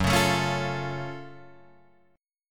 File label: F sharp Minor Major 7th F sharp Guitar Open G